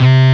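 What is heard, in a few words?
DISTORTED SYNTH BASS C-3; AKAI S-Series CD-ROM Sound Library VOL-7; STD SYN BASS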